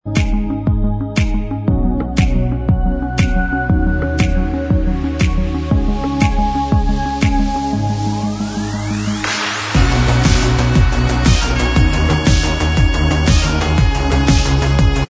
Inst

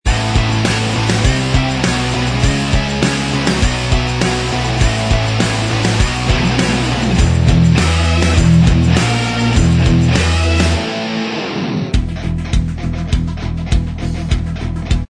Inst

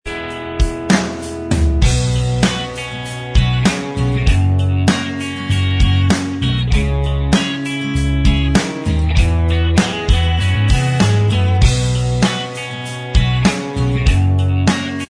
Inst

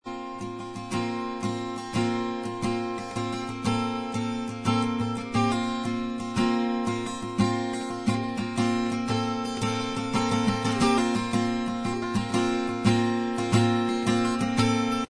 acoustic Inst

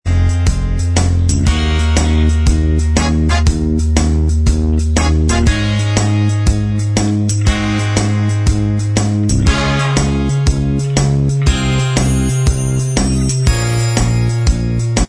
Inst

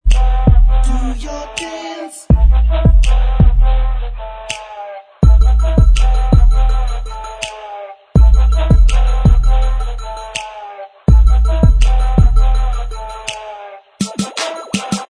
backing vox

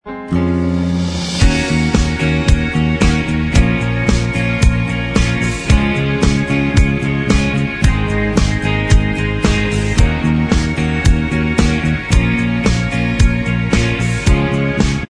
Inst Track

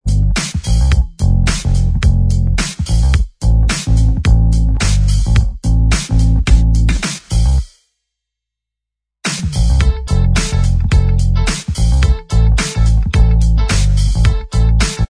Inst

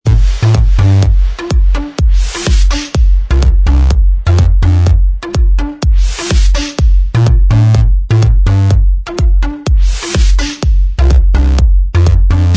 Inst Track

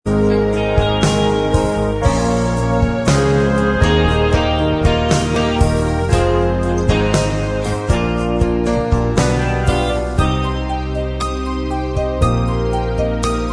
Inst Track

